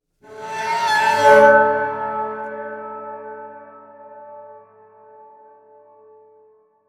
Cello

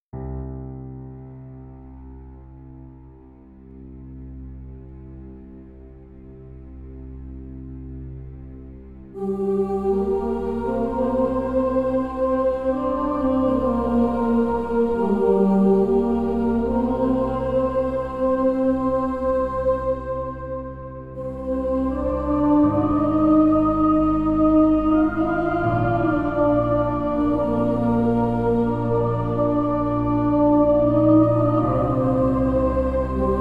Lullaby

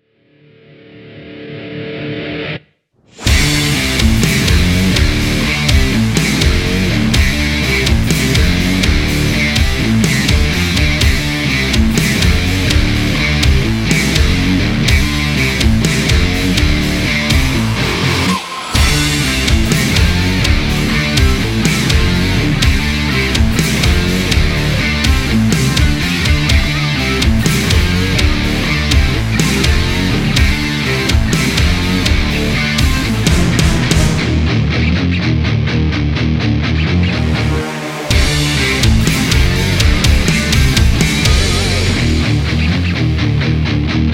Monster Riff

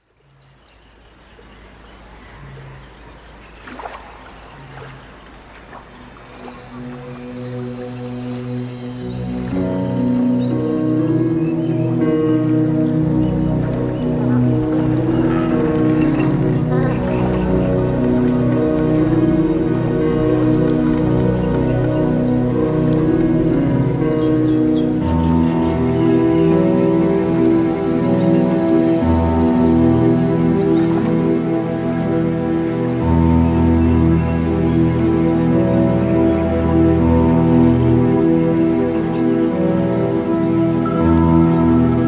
Dance of the Waves

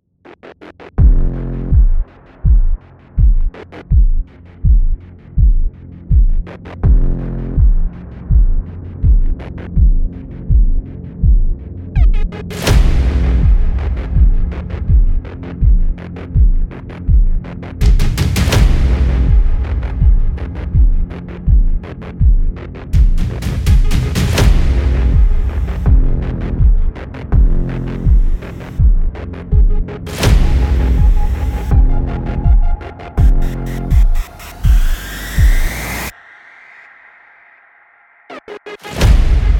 Tension

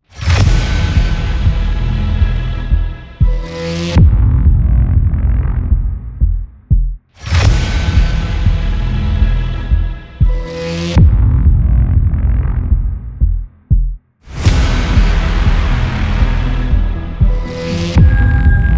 Blast

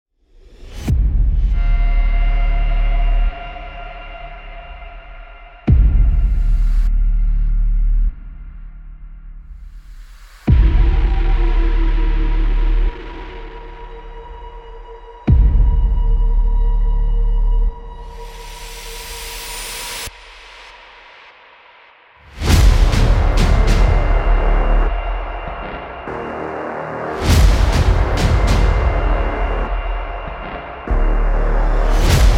Combat

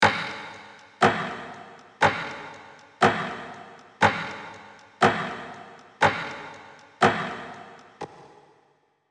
Clock

